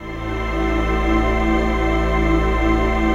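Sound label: chord pad, Pads; Best Service; XX Large - Pads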